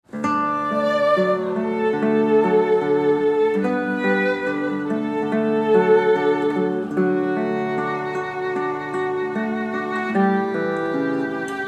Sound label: guitar arranged for violin